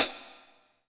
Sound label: Closed Hi Hat